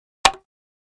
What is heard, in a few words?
2x4 Impact, hits